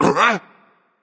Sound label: ouch berserk; cuchulainn